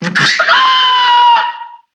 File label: bird intro